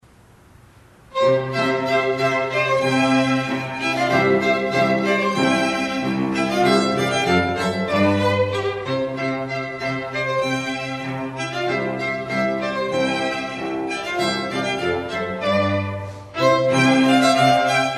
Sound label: String Quartet; Baltimore